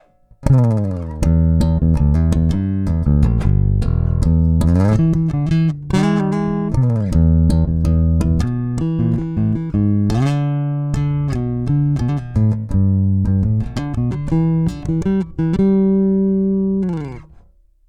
Neck Passive

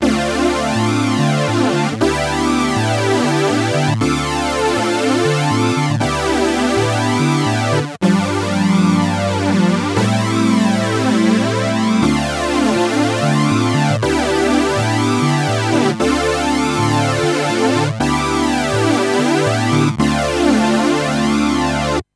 Sawtooth Synth MXR EVH Flanger

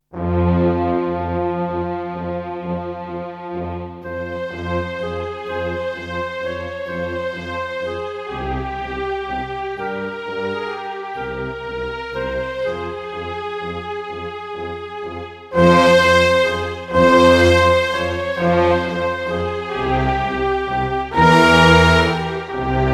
rom dynamic orchestra

